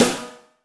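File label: snare drum; Zero-G; Chemical Beats; SNARE ZOO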